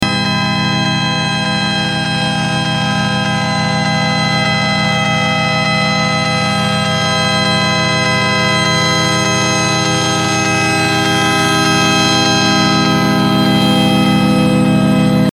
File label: gold tone protest noise; scientology gold base